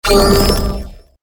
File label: Sfx Match Release Nuclear